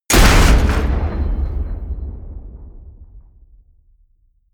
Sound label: hit metal